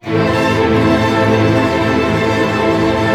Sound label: ARP A, STR ARP MIN; Sound On Sound - Peter Siedleczek's Orchestra